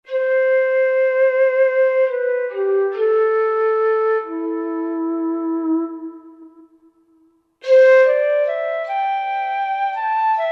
Bang Di Blow, Roland SR-JV80-14 World Collection Asia